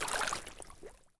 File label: swamp turn; Escape from Tarkov